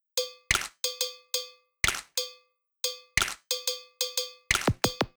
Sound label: Cow Bellz